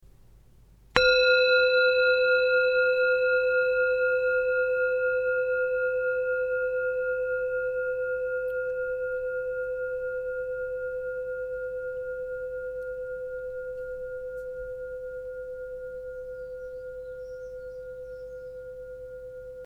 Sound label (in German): Klangschale